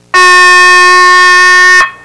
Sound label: boat fog